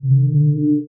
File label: Sci-Fi Electronic Cloaking; Science Fiction